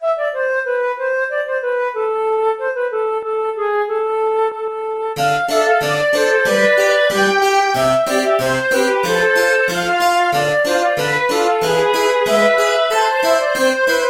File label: Sad Town